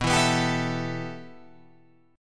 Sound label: B 7th Suspended 4th Sharp 5th, Guitar, Open F; B7sus4#5; strummed; JGuitar